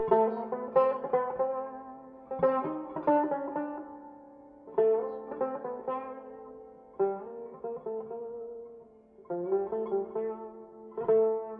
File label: tanbur